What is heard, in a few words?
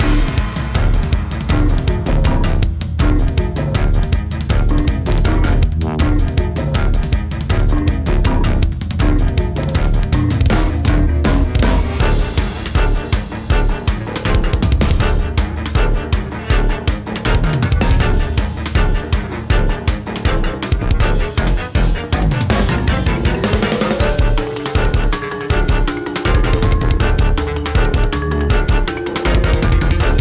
Time Bomb's Ticking!